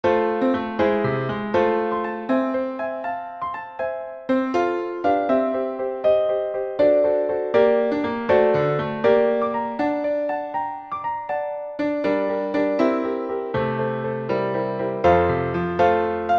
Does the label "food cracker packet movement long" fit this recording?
No